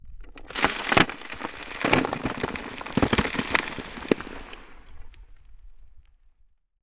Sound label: Sand Pebbles; TOOLKIT vol.2